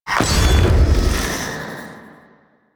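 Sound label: Grineer Anti Warframe Spear Impact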